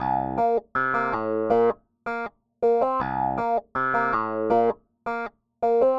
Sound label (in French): basse, funk piano, second line